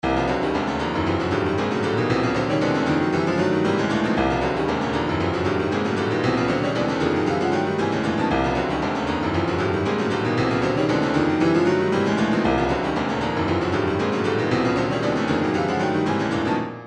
retro funk 116; Smoovie

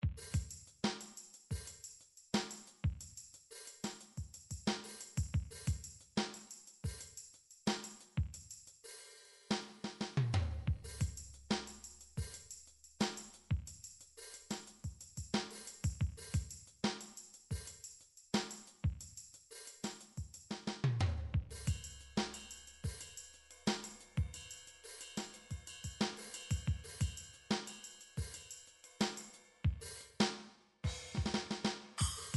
drum midi funk; Drum Midi Files Collection

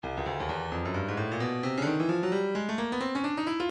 stylus breakbeat midi 131 bpm

